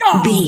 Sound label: voice male hurt pain